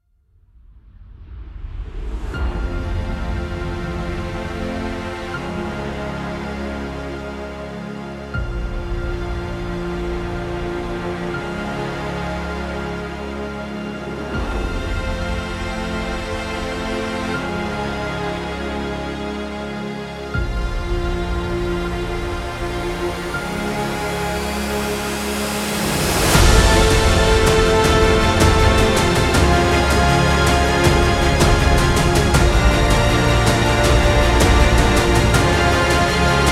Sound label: Epic Cinematic; motivational epic music